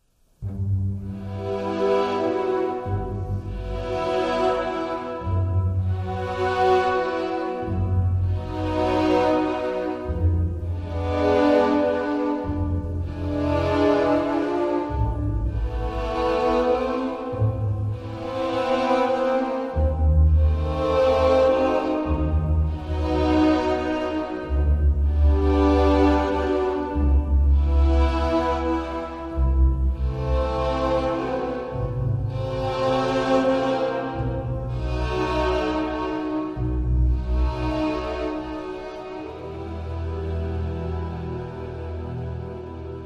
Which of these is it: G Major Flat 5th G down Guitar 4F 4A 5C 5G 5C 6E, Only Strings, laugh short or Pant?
Only Strings